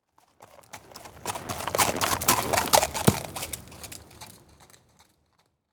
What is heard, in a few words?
Animal Horse Walks By On Gravel; animals; AdobeCC